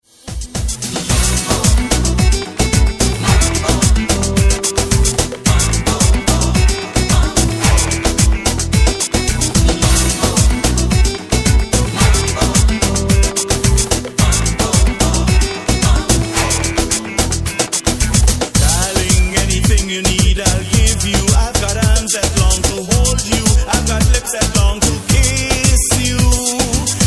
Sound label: mambo reggaeton